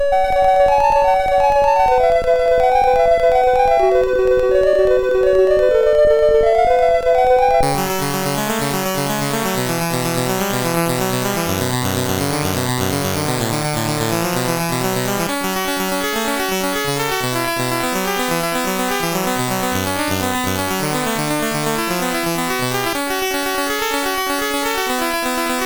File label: Final Synth, sid MOS6581R2; Warrior